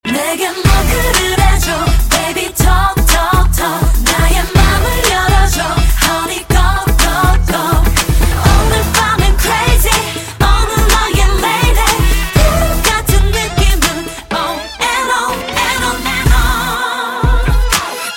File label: Toc Toc Toc